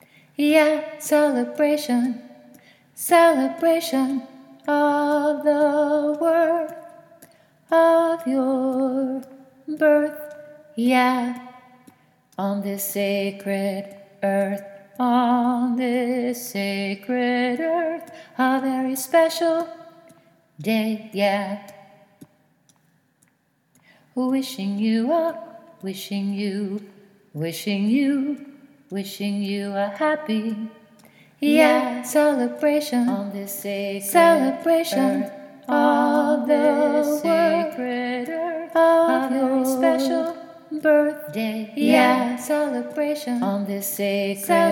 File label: Birthday Catch